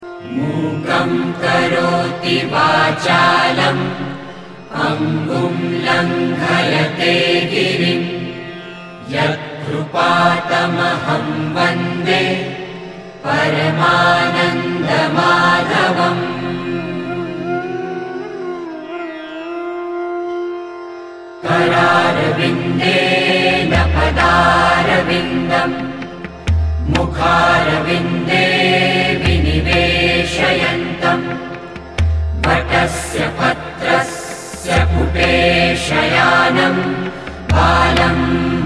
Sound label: Shri Krishna stuti, himalayan chants